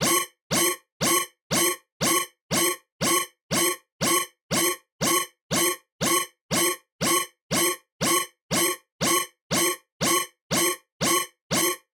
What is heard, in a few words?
Alarms, Computer Sound FX; SoundBits; Computer Sound FX 2.0